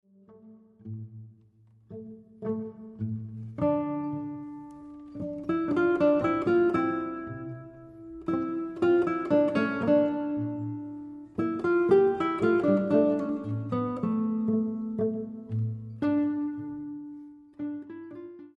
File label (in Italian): Larghetto e Spiritoso; vivaldi; concerto 8